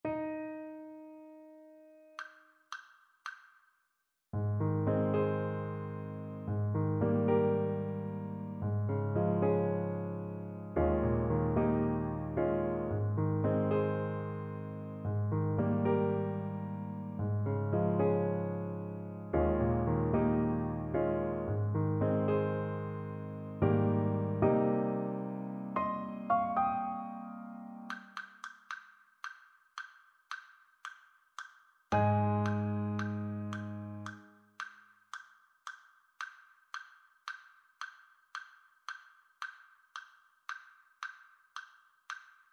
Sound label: Piano